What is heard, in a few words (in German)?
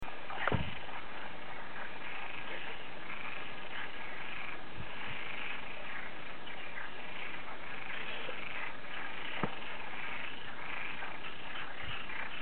froesche